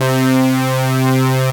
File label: Synth Brass; Poli; Very Ober-Whelmed XA